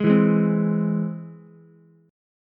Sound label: E Augmented, Ukulele; strummed